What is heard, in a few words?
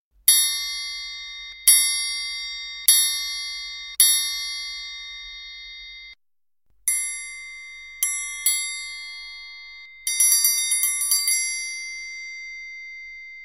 Triangle